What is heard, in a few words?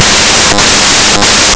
wookie, voices male; Вуки